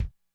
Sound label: Kick, Korg DSS-1